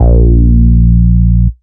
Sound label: Root A#, Ultimate Bass; Vengeance Samples